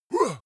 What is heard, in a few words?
male attack strained; schreie; menschen